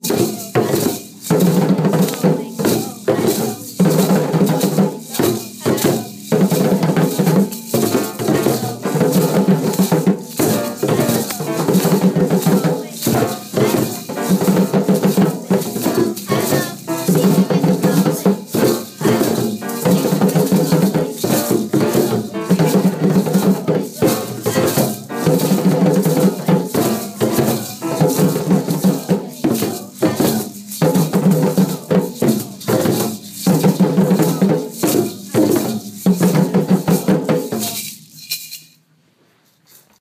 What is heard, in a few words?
drumming